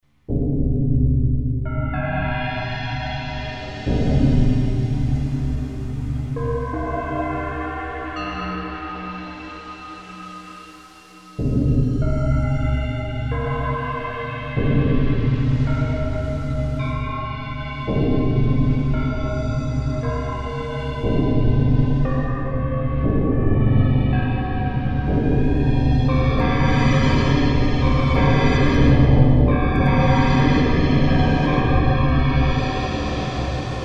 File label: ambient bells, yamaha sy99 synth